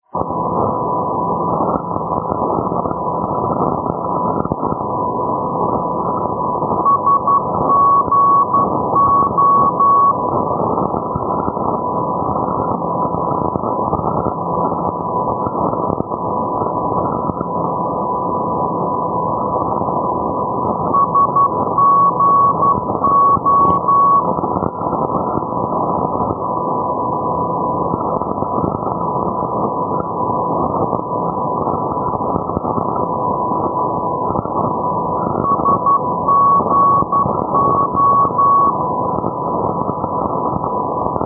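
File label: ndb, non-directional beacon; sagunto sgo; europe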